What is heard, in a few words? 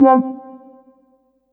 LEAD Creamy; Polyend; Plughugger